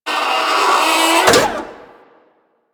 Sabotage Fuel Injector Open; Warframe; Missions

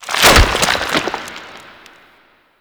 Rock Stairs Start; Ambience; Gameplay; Orokin; Warframe; Orokin Moon

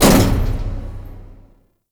Weapon Two Hull Mech B; Warframe; RailJack Weapons; Modular Weapons

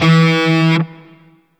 Shawzin Oct Two E; Warframe; Grineer Shawzin

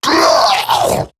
Death Eximus Grineer Male; Battle Barks

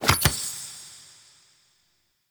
Card Pickup; Tarot; Warframe; Pickups

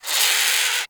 Avichaea Tranq Dart Grunt; Hunting Critters

